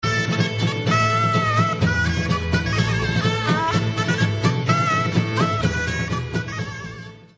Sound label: Shawm